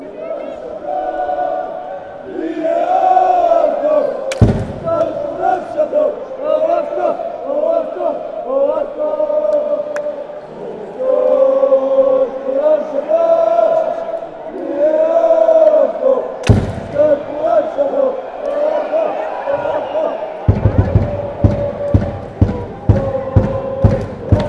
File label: chant, fanchants; aalesunds